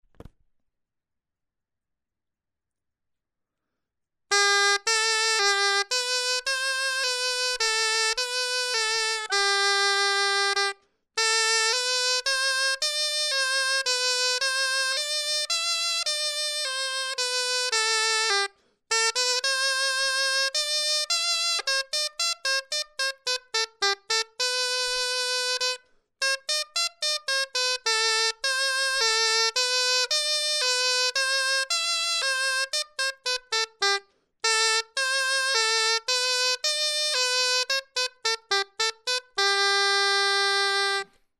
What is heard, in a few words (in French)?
clarin etude